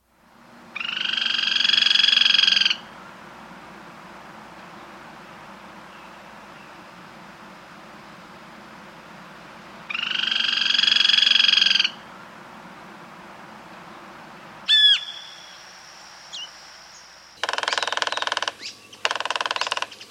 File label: Golden-olive Woodpecker; S. America